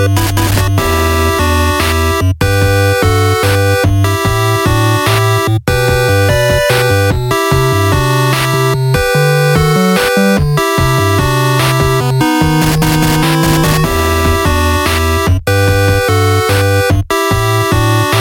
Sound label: 8 bit, ringtones